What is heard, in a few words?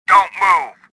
anger; Star Wars Jedi Knight II Jedi Outcast; XBOX